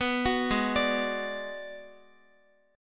Ab Minor 7th Flat 5th Ab, Guitar; arpeggiated; x,x,9,11,8,9; down; 4E,4A,5D,5G,5C,6F

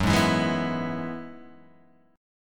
F sharp 7th Suspended 2nd Suspended 4th, down

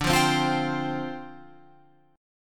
D Minor 11th D down Guitar Open F; Dm11 strummed

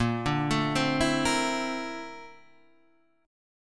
A sharp Major Sharp 11th, Guitar, Half Step Down; A#M#11

